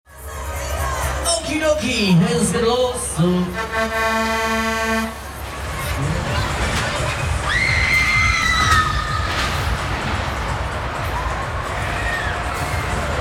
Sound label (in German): Basler Herbstmesse